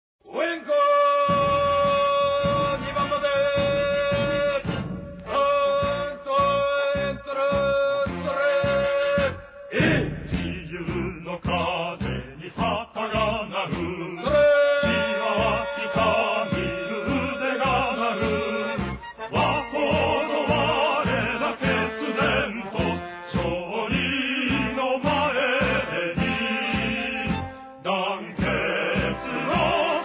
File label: cheer song